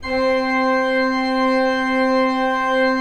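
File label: ORG C2, KEYS; Synclavier Sampler Library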